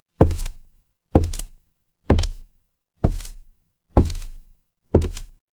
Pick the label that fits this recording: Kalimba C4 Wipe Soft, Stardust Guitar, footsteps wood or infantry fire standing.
footsteps wood